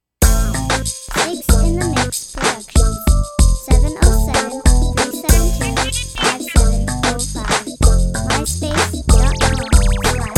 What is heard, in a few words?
guitars